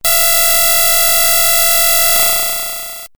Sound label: buzzsaw; sonic the hedgehog